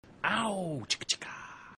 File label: Owww; M Boosh